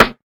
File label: natural snare A key